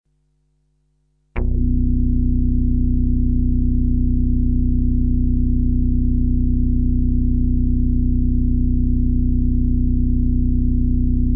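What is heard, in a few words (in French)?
infragrave nappes statiques, Sample plus métallique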